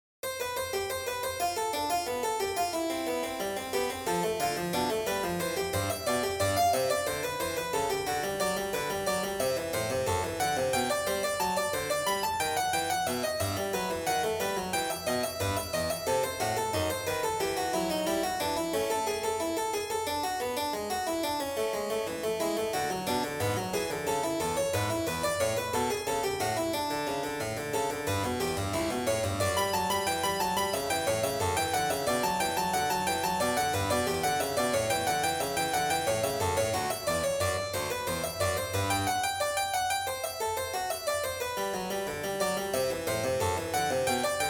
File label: Invention in two voices